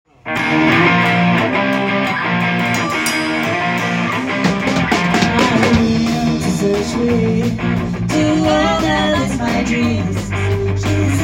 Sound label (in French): Sans Basse